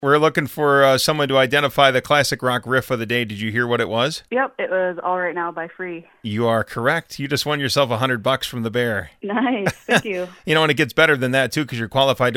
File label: Riff